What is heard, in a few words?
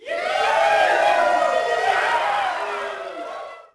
CROWD POS MED; Cars; XBOX